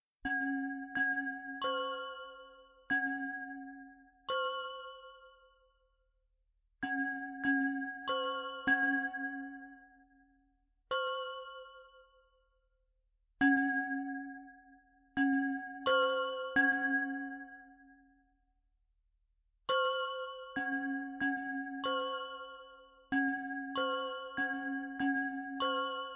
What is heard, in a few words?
Bells